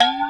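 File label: WASHEE BE, percussion metallic; Rarefaction; Poke in The Ear With A Sharp Stick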